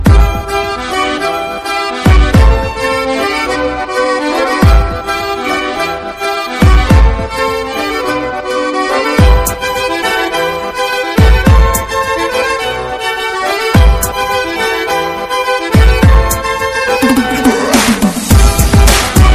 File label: Bboy Breakdance Song